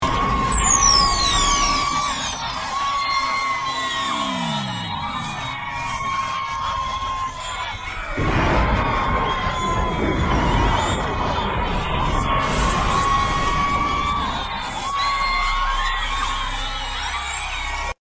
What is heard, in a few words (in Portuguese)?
abertura ao vivo